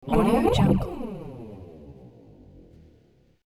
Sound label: energy pulse; sound ideas